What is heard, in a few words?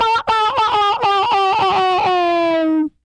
WAH PERC, MALE PERC; Spectrasonics; Vocal Planet; Jazz FX